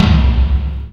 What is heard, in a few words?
hit, PERC; Techno Trance Essentials